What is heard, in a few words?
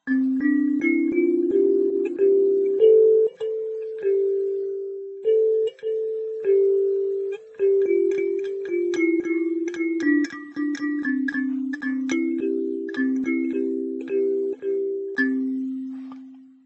kalimba